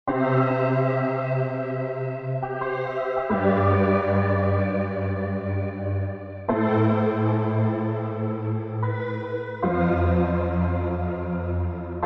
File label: Pad Dance